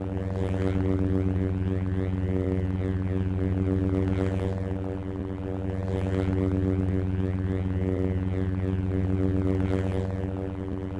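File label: sith lightsaber hum loop; weapons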